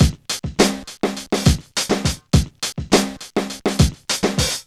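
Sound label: 103 bpm, Funky loops; Zero-G; Funky Elements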